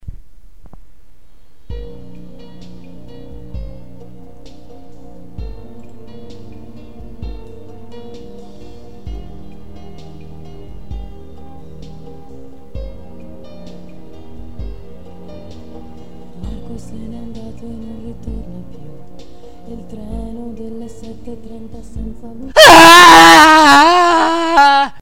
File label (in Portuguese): musica para relaxar